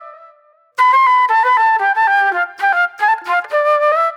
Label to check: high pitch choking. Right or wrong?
Wrong